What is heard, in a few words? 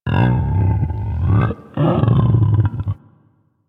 Landscape Mushroom Creak; One Offs; Warframe; Venus Open World